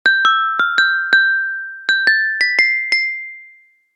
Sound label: christmas message tone